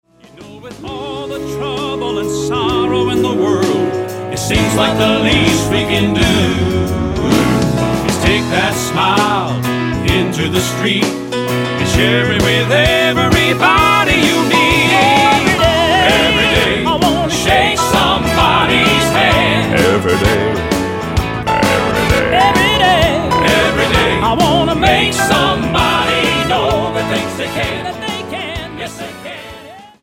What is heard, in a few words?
Quartet